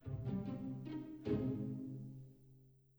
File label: Strange, Rpg Game Tracks; 25 Rpg Game Tracks